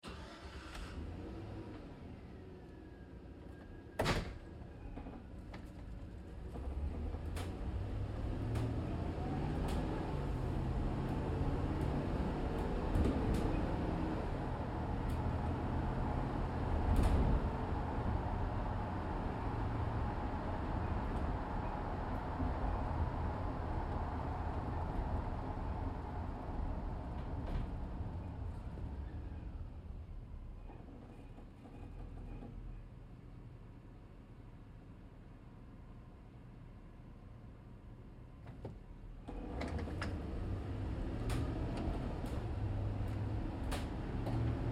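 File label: Truck GMC Savana interior Drive Medium; Trucks; Vehicles; 2010 GMC Savana; BigRoomSound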